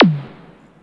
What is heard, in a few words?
SYNDRUM; DRUM SOUNDS